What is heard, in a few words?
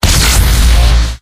revive; video games; source engine; tf; mvm